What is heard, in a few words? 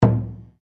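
Drum Taiko mezzo forte, Japanese Taiko Drum; Miscellaneous Musical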